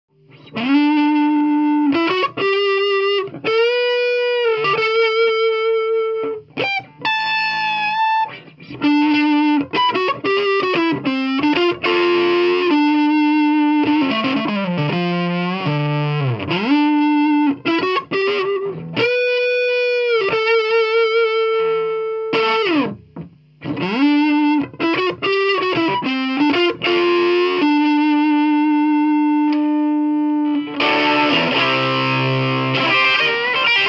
strat

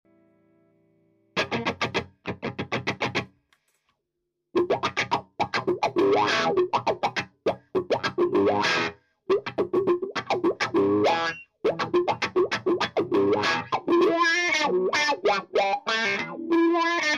wah; DIY; 60s ods

